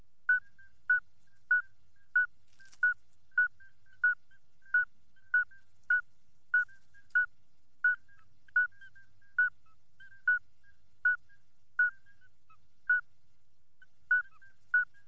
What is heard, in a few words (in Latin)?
alytes obstetricans